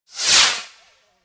Fireworks launch whoosh sizzle

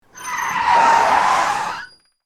Car braking tire screech

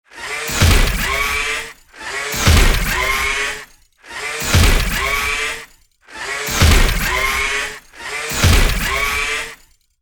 Robot heavy unit footsteps walking; sci-fi

